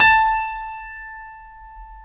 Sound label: StereoGrand, Keyboards